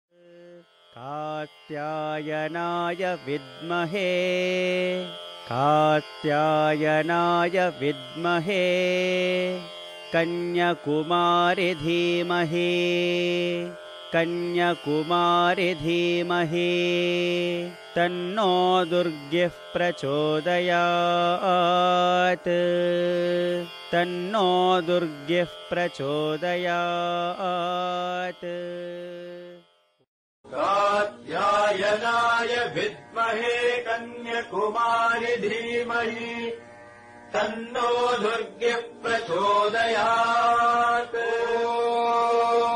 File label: Durga Gayatri mantra